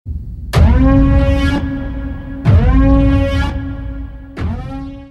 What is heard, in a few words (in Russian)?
sirena